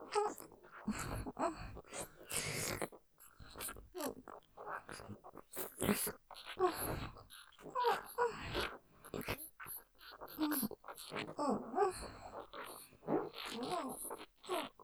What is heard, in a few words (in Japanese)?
キス さらにねっとりディープキス; 舌をフェラみたく; ステレオ